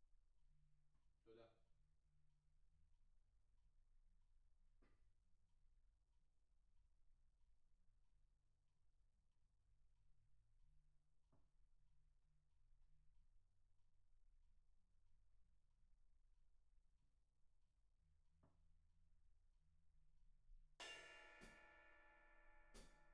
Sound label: Room, 94bpm